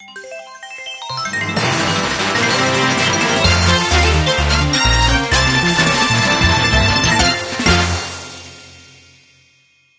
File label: Stage Clear